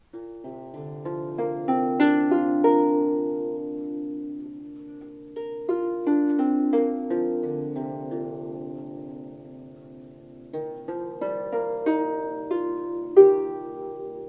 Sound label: harp playing sea breeze